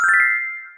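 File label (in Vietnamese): tiếng chạm vào đồng tiền trong game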